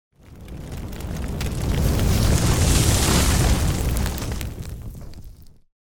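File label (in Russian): обрушение с огнем; Звук огня; Звук огня для монтажа перехода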